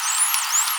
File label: Whistle